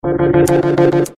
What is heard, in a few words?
riff